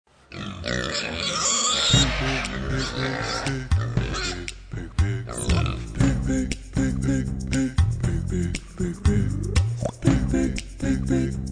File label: song; Enterisol Ileitis; new live oral vaccine for porcine ileitis from boehringer ingelheim